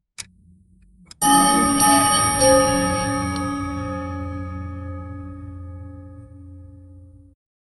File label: clear deep clock chime st